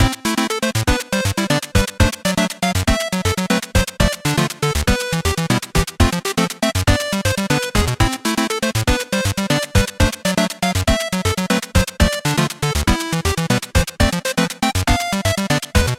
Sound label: Core 8bit; Metallic Child